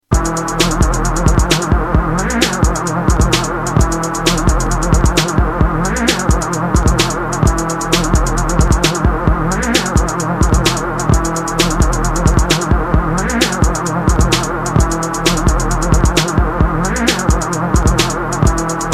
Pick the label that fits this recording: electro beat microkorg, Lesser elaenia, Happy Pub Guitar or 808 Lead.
electro beat microkorg